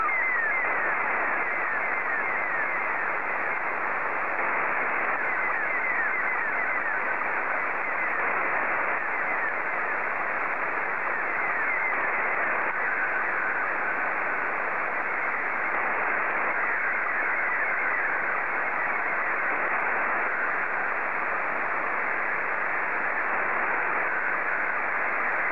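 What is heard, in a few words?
SSTV, Scottie 2; JA3OEN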